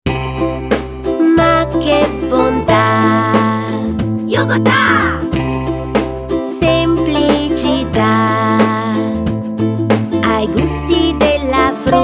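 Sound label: jingle; yogota 03 fm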